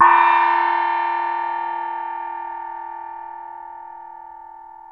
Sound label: TIBETAN, Asian Cymbals